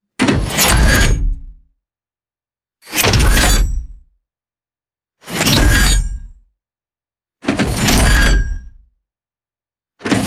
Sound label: heavy metallic mechanical weapon loading, guns